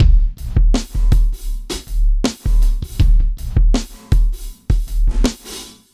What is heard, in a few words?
Street Tip, R&B Drums